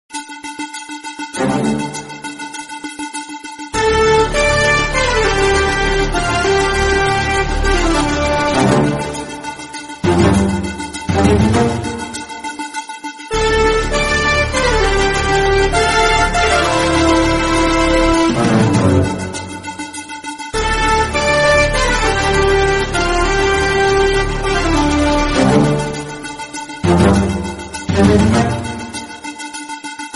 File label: romantic bgm tamil; gabarsingh